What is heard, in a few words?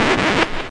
Electro FX; Ueb HE Electro FX